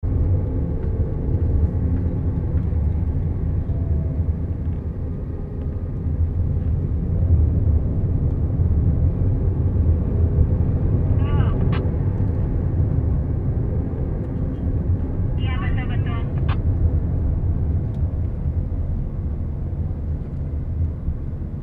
taxi radio, Indonesia

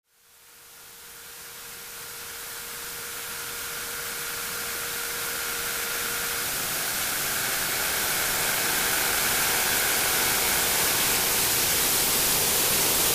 white noise

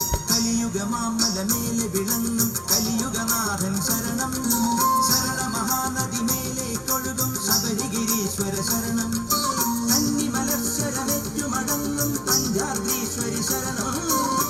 song, South India street recordings; kali uga

